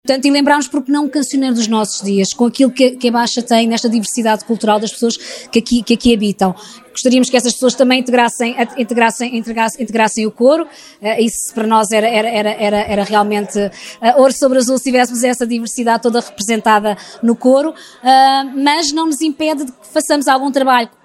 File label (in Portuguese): CORO